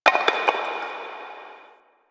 Stone Debris; Warframe; Quests; TheNewWar; Ambience; Zariman

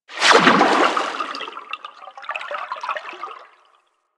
Sharkwing Movement Transition, Vehicles; Warframe

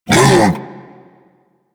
Predasite Vocal Tranquilized Grunt

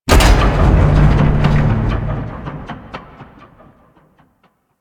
Void Dragon Latch On; Enemies; Warframe; Duviri

